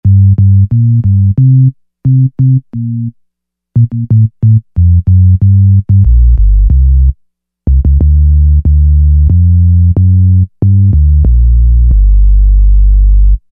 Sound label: 808 Bass; Roland SRX-05 Supreme Dance